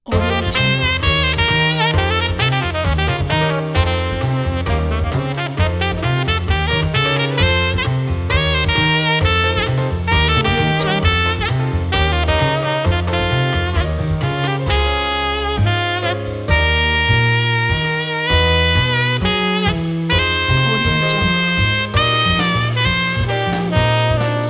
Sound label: exciting contemporary jazz sax